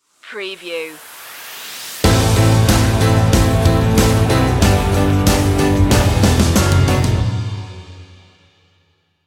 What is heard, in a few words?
going the distance stinger